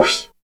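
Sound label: 96 MOD CYM-L, cymbal; East West - Phat & Phunky CD2; 90's Sample CDs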